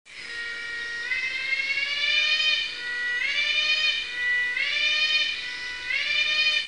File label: Morning fanfare